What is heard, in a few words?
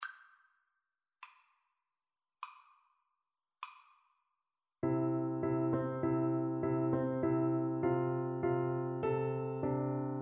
sheep Recorder; bach